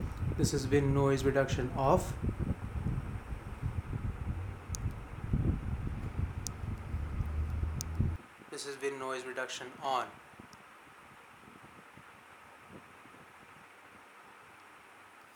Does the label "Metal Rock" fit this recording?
No